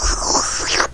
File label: Slurp; Asterax